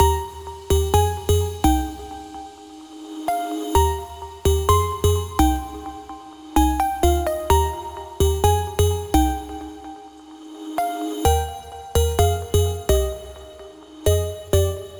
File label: 128 BPM C Min, house breakdown loops; Feelings; Cymatics